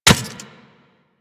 Worker Metal Leg Step Default; Solaris; SolarisWorker; Steps; Warframe

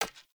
Hunter Bow Grab; Weapons; Player; Bows; Envoy Hunter Bow Ranged Melee

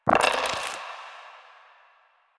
Dice Roll Five; Warframe; Koumei

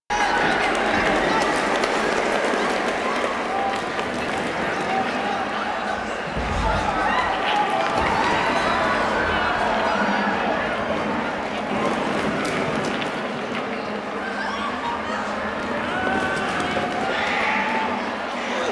Town Walla Panic Loop; Warframe; Duviri

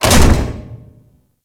Barrel Drop; Ambience; Warframe; Grineer Galleon

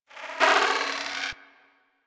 Beep Stutter; Warframe; Ambience; Corpus

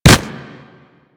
Kubrow Run Snow, Footsteps; Enemies; Warframe

